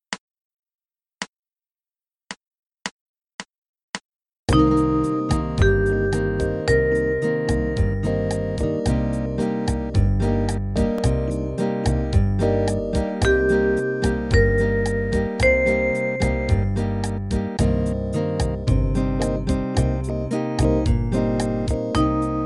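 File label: minor 7 first inversion, arpeggio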